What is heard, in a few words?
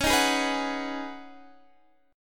Db 7th Suspended 2nd Suspended 4th, Guitar, DADGAD; x,16,13,13,14,16; Db7sus2sus4 strummed